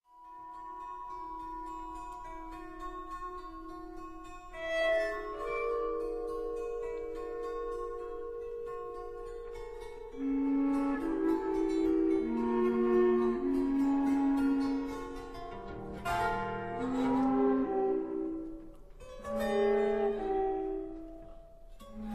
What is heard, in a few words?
Archipelago, for chamber ensemble; Brown